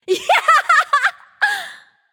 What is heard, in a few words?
yay; smite; freya